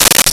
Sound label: Maracas; Nintendo; July 2020 Gigaleak